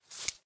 map event hover

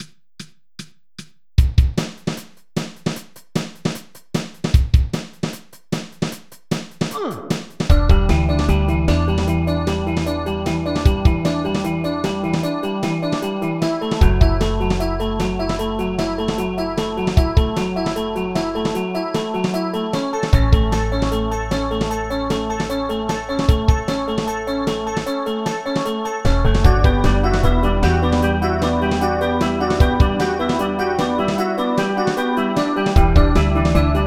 Arpeggi; in rainbow roads 2023

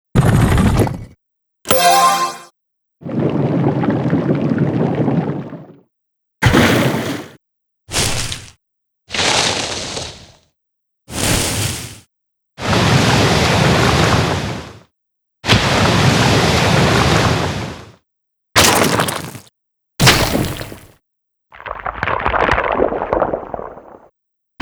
SE Collection Terrain - Trap; Ghosts n Goblins Ultimate

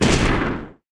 Scatter Gun Shoot

